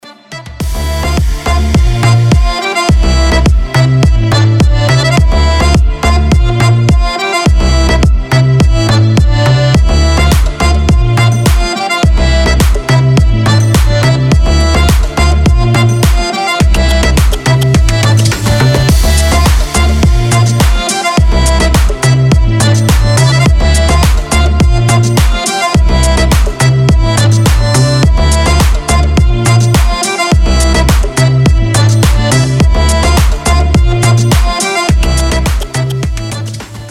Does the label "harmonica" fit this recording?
Yes